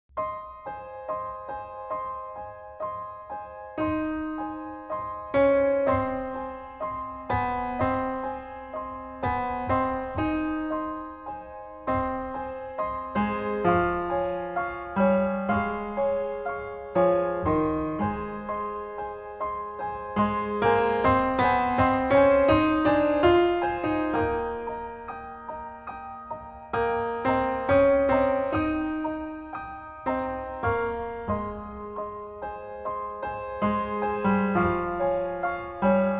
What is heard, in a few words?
Keyboard